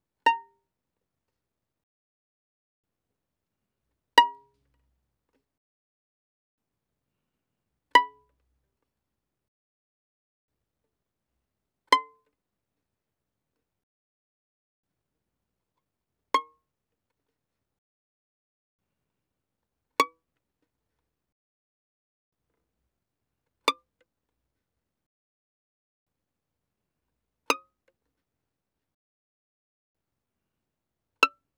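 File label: Violin pizzicato fortissimo sul E Bb5 G6; Strings; violin2012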